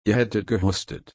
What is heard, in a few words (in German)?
husten